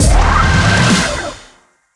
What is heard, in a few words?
Revive Added; Subway Surfers City Sound Effects; Android